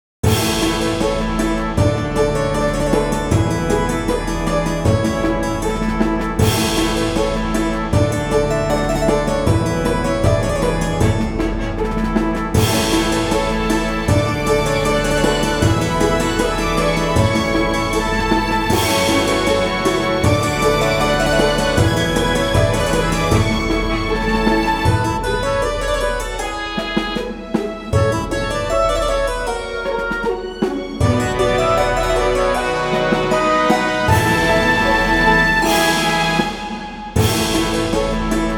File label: graceful castle, bgm